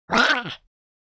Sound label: Alien creature pain small